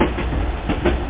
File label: chug